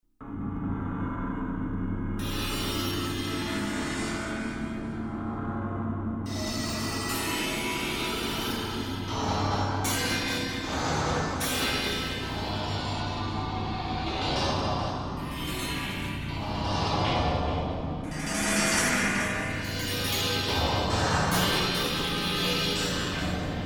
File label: pad noise, yamaha tg500